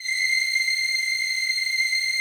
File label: PAD, DIGI-PAD